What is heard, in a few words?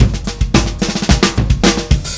Drums Fill, Indie Rock